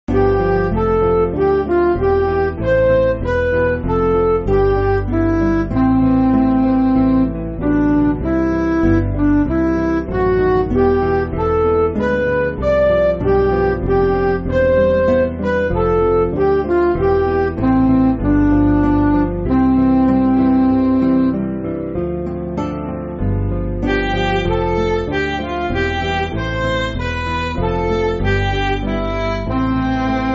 Midi

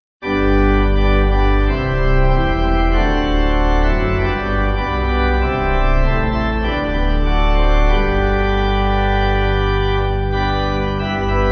Pipe

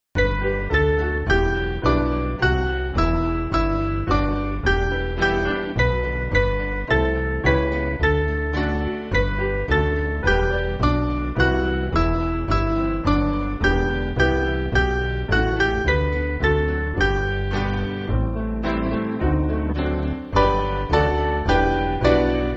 Jesus Tender Shepherd, piano

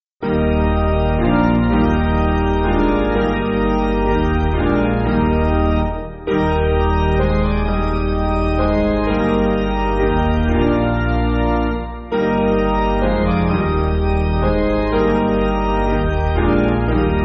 Piano; Eb

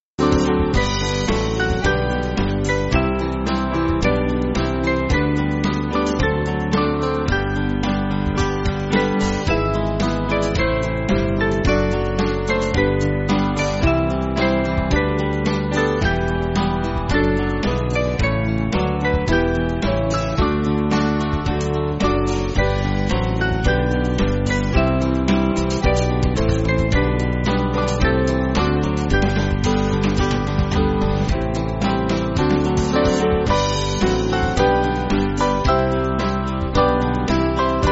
Band

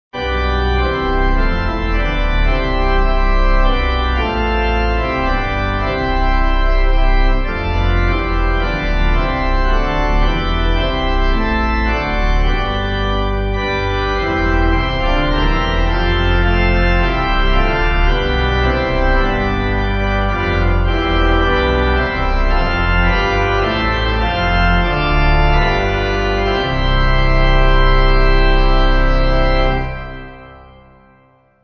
Pipe; Was Lebet

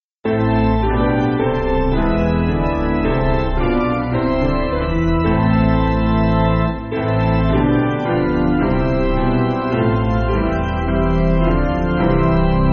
Ye Choirs Of New Jer OPiano; 6/Am

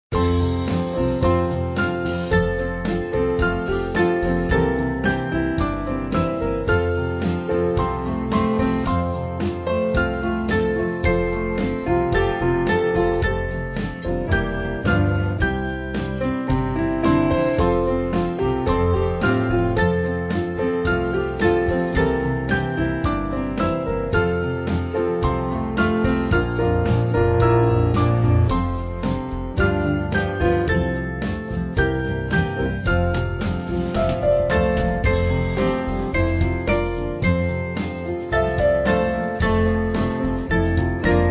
Band, Music Backing; I Have A Saviour; Sankey

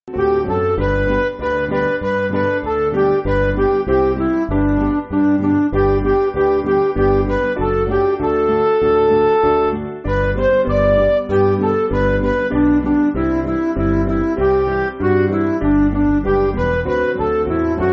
When My Life Work, Midi; Sweney

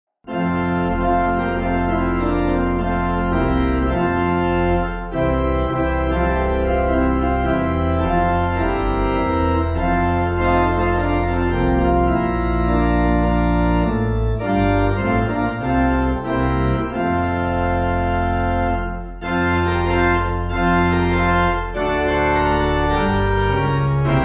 Pipe

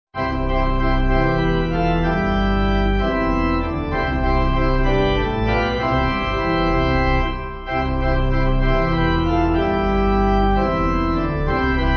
Wonderful Story Of Love, Pipe, Pipe Organ; 3/Bb-C; Driver

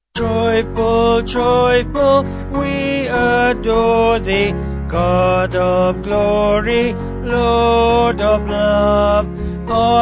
Vocals Band

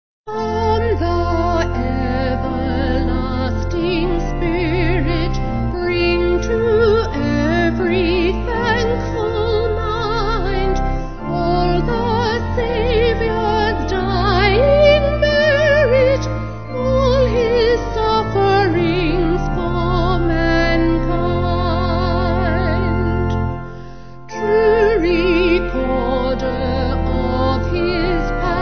Vocal Organ; Come Now Everlasting

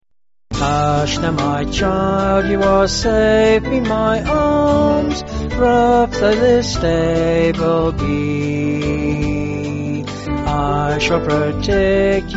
Piano, Band, Pipe Organ; Hush Now My Child; Skye Boat Song

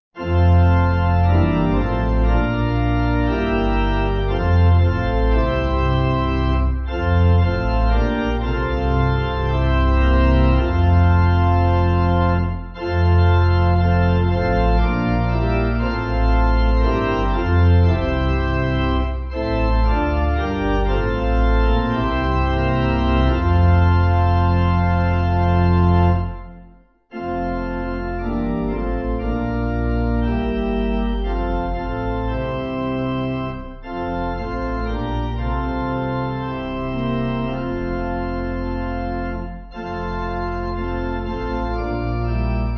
pipe organ, Music Backing Files for Churches; Come Holy Spirit; Grafenberg

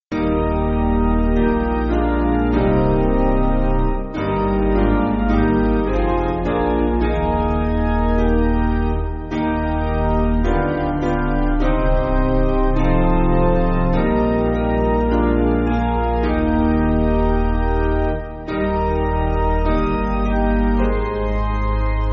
Piano, Music Backing; Eb

